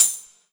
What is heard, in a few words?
Yamaha TG100 Tambourine